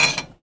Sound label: Foley